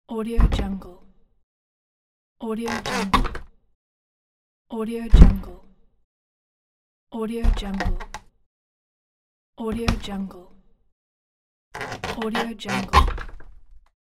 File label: old door